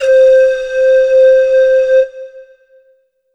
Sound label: Korg M3R Pan Pipes C5